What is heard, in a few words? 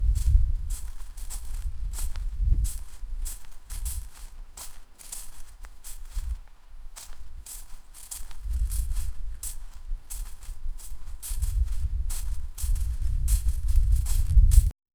footsteps on the grass; field